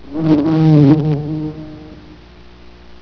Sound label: FLY